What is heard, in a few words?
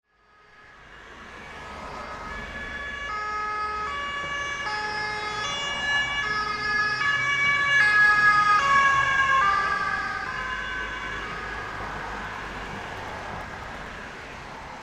Ambulance emergency pass by siren on